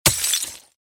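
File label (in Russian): один удар по куче стекол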